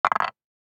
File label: DICE on Wooden Tray, Throw and Roll, Metal, 1 One Die; Samples; ArticulatedSounds; DICE Sfx Party (Extended)